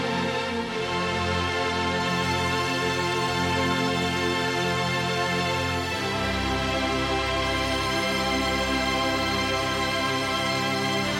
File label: Strings